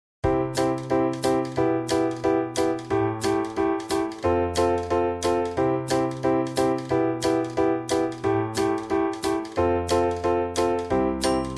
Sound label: music sunny day